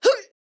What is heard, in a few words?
woman injured grunt